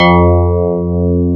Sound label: PIA DX E-PIANOS